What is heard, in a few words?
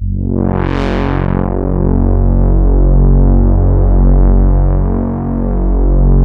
MONO PAD; PADS